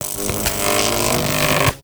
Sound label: Lightning Strike Charge; Warframe; Teralyst; Rainalyst Abilities